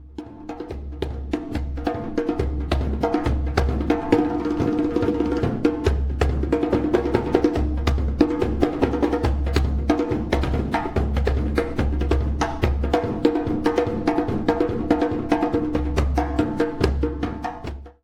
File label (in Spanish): djembe; reciclar tocar